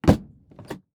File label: Transportation Door Helicopter Door Close And Latch Interior